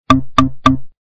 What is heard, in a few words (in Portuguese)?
curto para mensagem ringtone